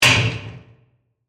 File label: Lever Flip, Industry, Lever, Flip, Mechanical; Machinery; Machines; BlastWave